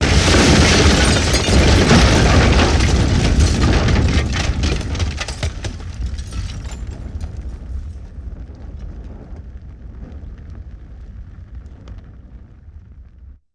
Explosions with debris and fire

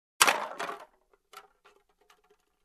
Empty can trash

